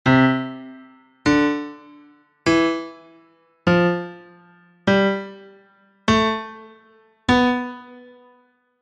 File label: Notes, MIDI notes, arpeggios; Midier